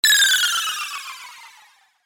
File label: magic